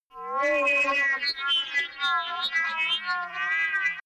crazy science objects, energie

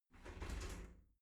shower slide door slide close

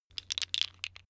tiles wood rattle; objekte; holz

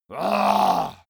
monster growl aggressive short; kreaturen

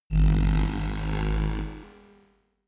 short sci fi sweep; whooshes rises; risers

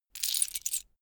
key ring large put down; objekte; schluessel

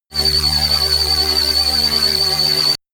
shot aching tooth low; bewegung

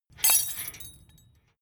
metal tools small drop; soundpool

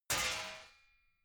metal sheet crash; metall

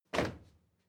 step crowd march normal stop; schritte

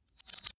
thin metal vibrate reversed

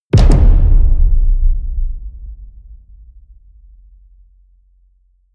drum hit loose large, percussion

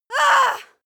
female moan pain short; koerpergeraeusche; menschen; soundpool

